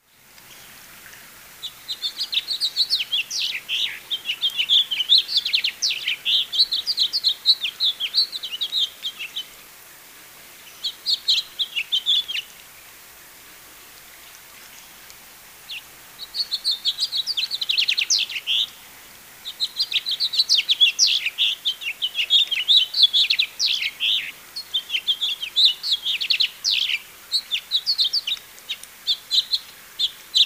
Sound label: House Finch Haemorhous mexicanus; Marvin